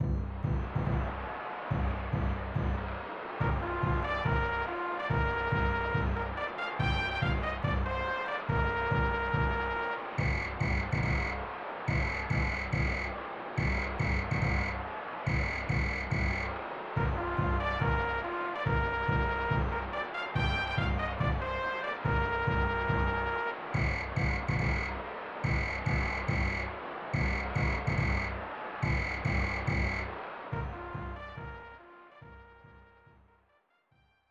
Baseball

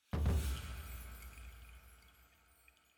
item Jingle Non Tonal

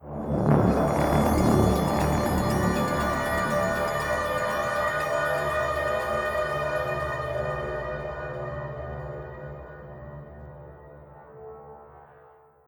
Stinger Pulsating Short; Execsearch

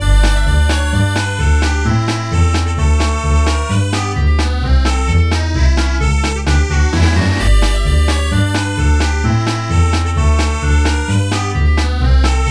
BGM Polka Night; Irodzuki Tingle no Koi no Balloon Trip; NDS

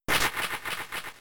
Leer

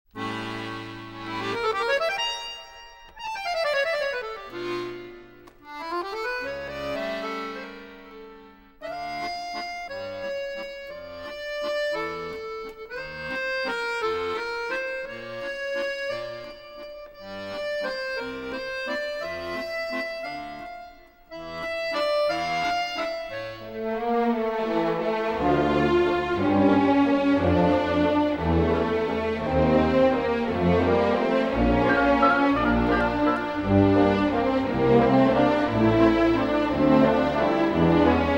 waltz; Neko no Ongaeshi

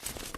sound Marsh Glider Open; freedom fall

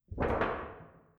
Vent Move; Among Us

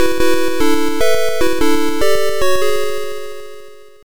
Level Completed; Gods; Atari ST

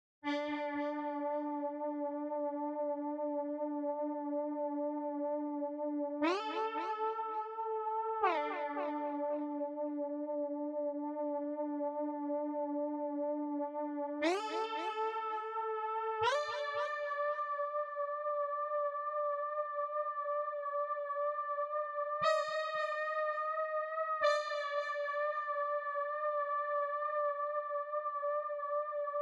Leads

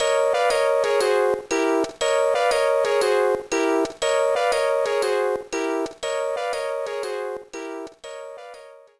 BGM; Sanrio Puroland All Characters; gba

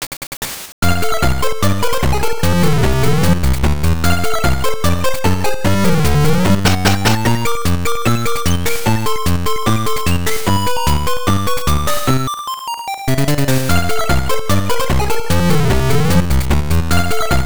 Baseball; tiny toon adventures wacky sports

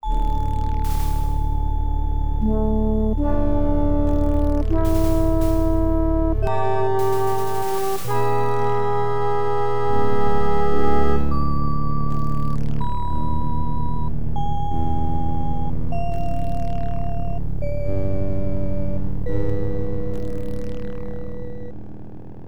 Vaporizing Water

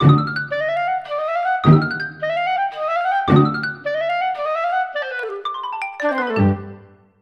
Stinger

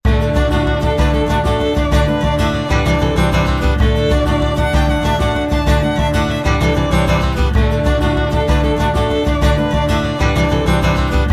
Tradition irish; Adventure Xpress

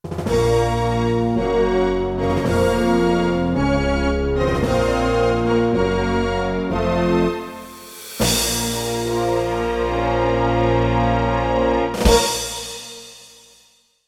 Death Theme - Standard 2 (Serious); King's Quest 2 Remake

